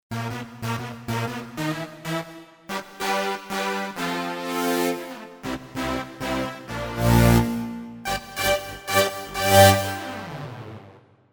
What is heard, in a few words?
Black Brass, Roland JV-880; Factory Patches